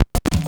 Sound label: SCRATCHES; Scratch 1